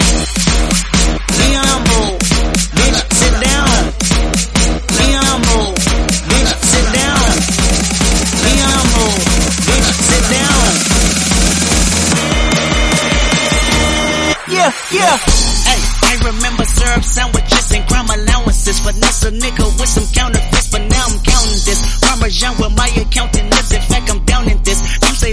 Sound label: 128 80 Slam End 128bpm C